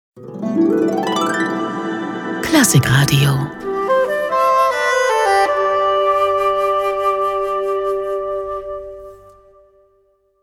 Station ID

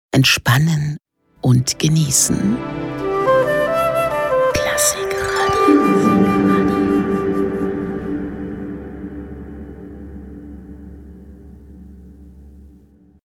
Station ID

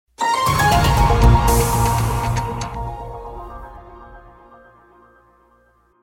Sound Logo Info Full; MDR S AN

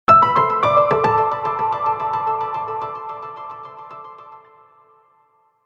Sound Logo; NDR Info

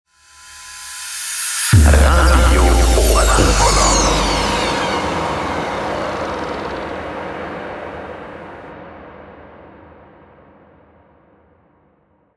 Universal Transition; RADIO OBERLAND

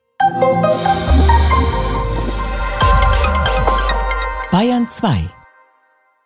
Promo Bumper

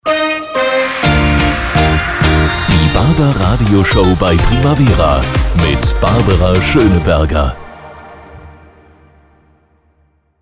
Show Opener; RADIO PRIMAVERA; BarbaRadioShow

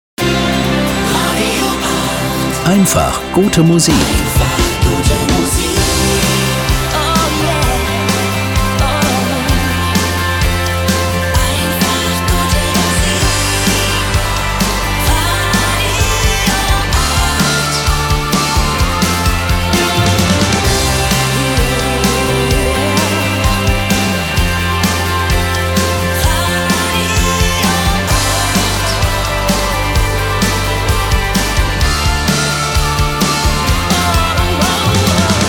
Opener New Rock fast; RADIO 8

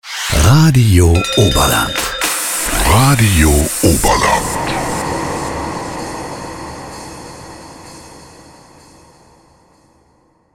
Universal Transition; RADIO OBERLAND

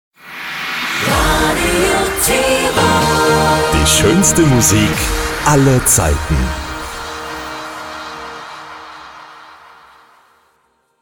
Shotgun; Radio Tirol

